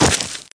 grass; Microshaft Winblows 98; Parroty Interactive

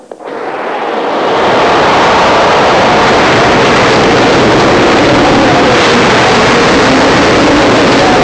gale force wind; nature; Sound Effects for Multimedia - Volume 1

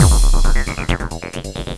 Bublz; Cloudz 1.0.3

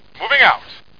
moving; CommandStrike

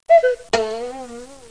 Box; JONAH

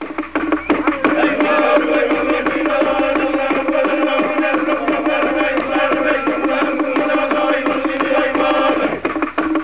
African Tribal Music

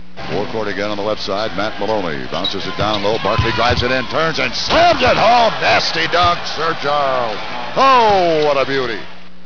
Rockets Exit; Rockets Exit Windows; 250 Desktop Themes

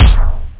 smash kick; OctaMED SoundStudio V1; jazzies samples; perc

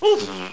Oof; JONAH

